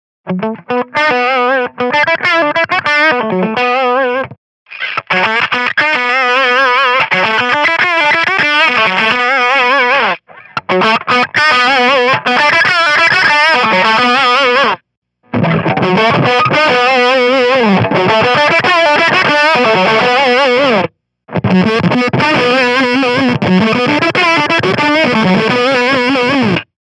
fuzzy variety, pedals

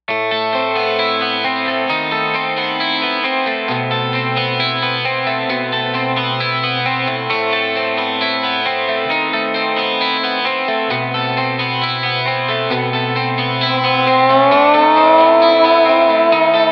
Sidewinder Clean; mojave

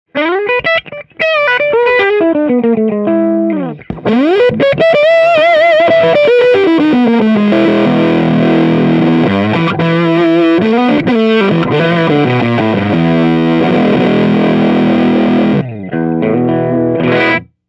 strat bridge, pedals; gboy

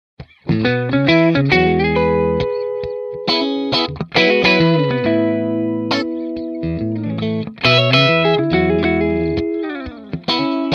Plexi 45 Clean; mojave

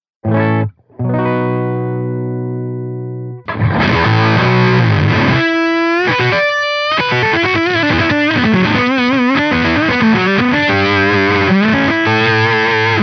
100 octave, pedals; octo59

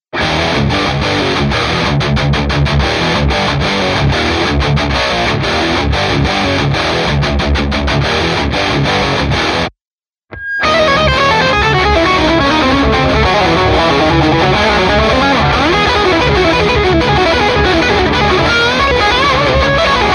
bogner DUENDE 4 channel B solo Both

